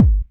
Kick, Drum Hits

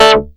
short chor; Masterbits; Vintage Voltage; SEQ-FX

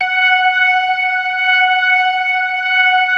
ORGAN, 4 DRAWBARS; Keith Emerson Signature Series

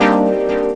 Pad Note; Zero-G Jungle Warfare 1, 2 & 3; 90 s Sample CDs

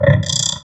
VOX VOX, Electro Vox

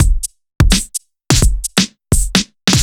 Kit Heavy Swing, 85bpm; off the grid

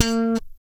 POP B 4, bass; Spectrasonics; Bass Legends

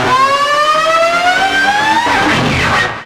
wild, special efx, guitar; East West; Steve Stevens Guitar Samples Collection

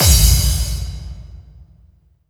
BD-FX, Dance Mega Drums; Best Service

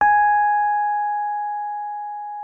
G#5, RHODES; Xsample VOL-1

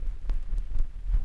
VINYL, 145 BPM; Ueberschall; Drum & Bass Resonance

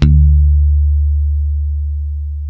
D 3, ultra pick, bass; Spectrasonics; Bass Legends CD 1

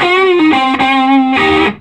SOLO, Vintage Blues Guitar

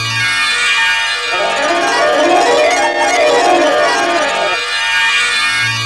SARANG; AMG; Now CD-ROM (Roland); Bhangra

